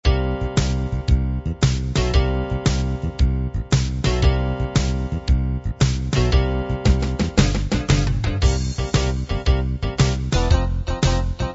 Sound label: 68 rock